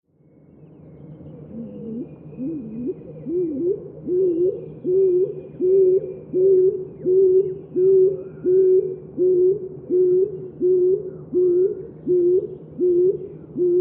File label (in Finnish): uuttukyyhkyn aani